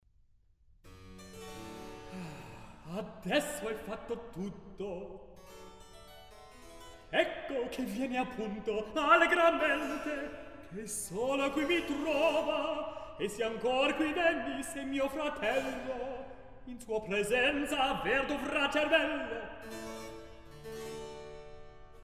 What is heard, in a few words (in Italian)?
Recitativo; Adesso e fatto tutto; Mozart; La Finta Semplice